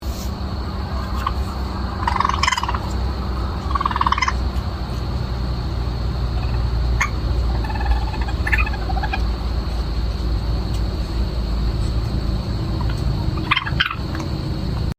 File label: noises, raccoons